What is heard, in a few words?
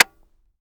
disconnector; viper